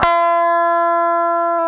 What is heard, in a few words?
GTR ELGTR, Clean E.Gtr, Velo Chord; Roland LCDP02 Guitar and Bass